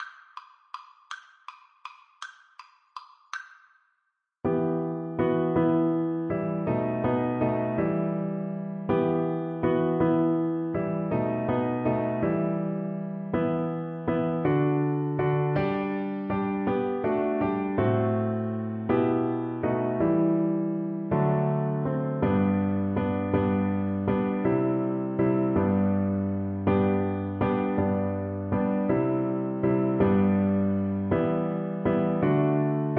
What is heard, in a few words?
we three kings violin